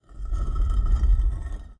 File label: scroll monolith